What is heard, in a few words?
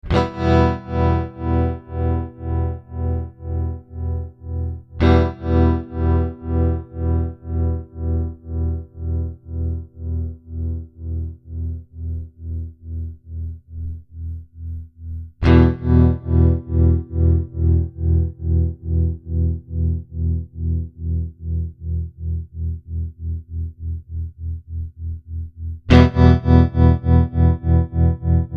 Tremolo